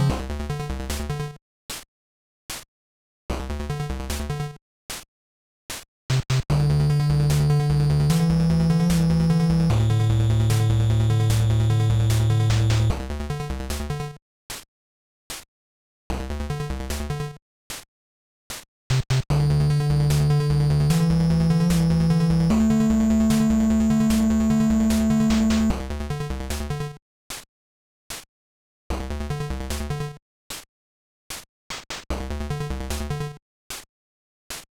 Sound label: 8 Bit Cave; biff bolton ep1